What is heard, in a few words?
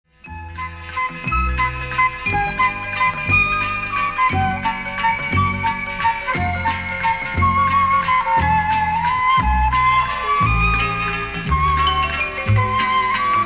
Modern Music Box